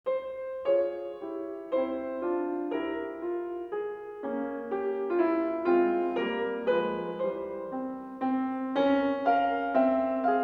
Violinsonate F-Dur - Variation V

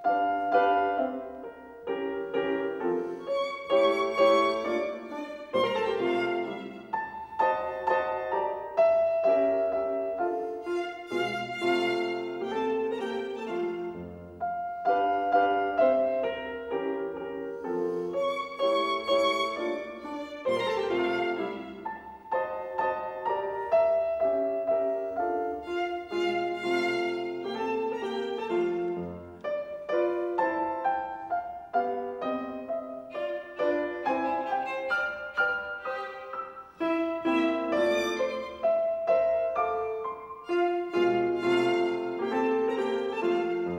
Violinsonate F-Dur - Variation III

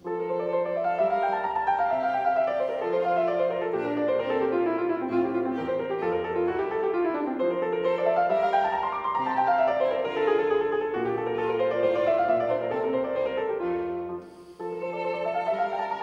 Violinsonate F-Dur - Variation VI

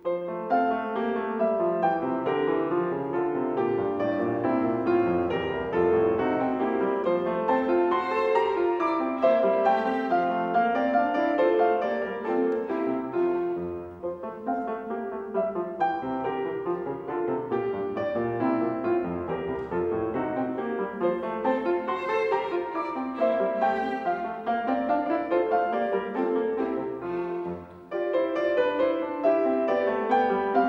Violinsonate F-Dur; KV 547; Variation II

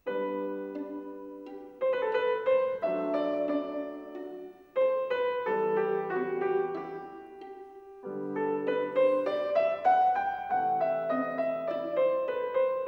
Violinsonate G-Dur - Variation V, Adagio

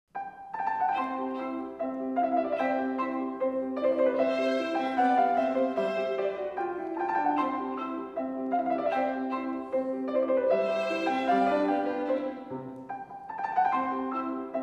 Violinsonate C-Dur (Fragment) - Allegretto; WA Mozart - The Complete Works 170CD; Volume 4 - Chamber Music-Violin Sonatas-Church Sonatas

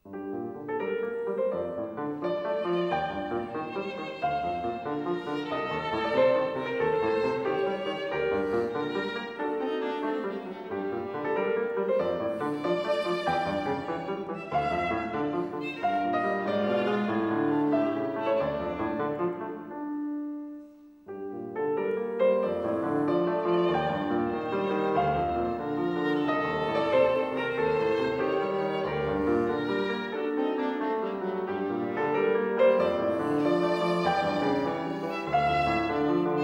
Violinsonate G-Dur - Variation IV

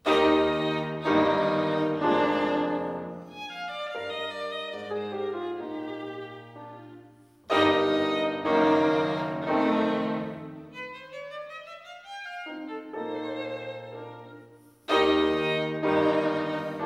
Violinsonate Es-Dur - Variation IV; Mozart; Chamber Music; Violin Sonatas

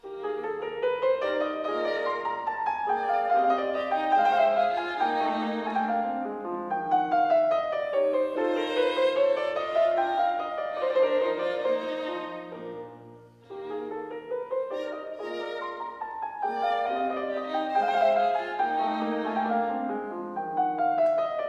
Violinsonate Es-Dur - Variation II; Mozart; Chamber Music; Violin Sonatas

